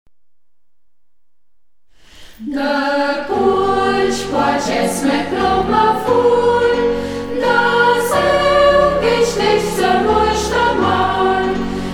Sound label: chor, mundart; Ortsmundart: Burgberg; Der Burjbärj; Burgberger Chor; Hegt wird gesangen